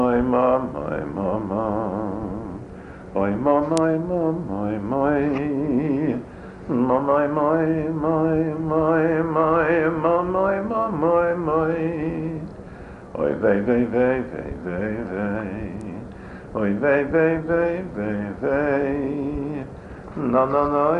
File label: niggun